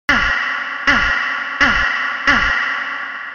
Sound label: aaaaaghh